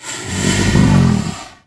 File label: worm idle growl, giant worm